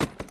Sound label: hit